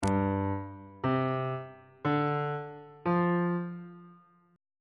f double sharp dominant 7th suspended 4th chord intervals on bass clef